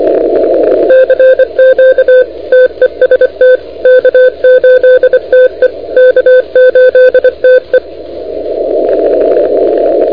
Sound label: CW 28MHz; ARRL10M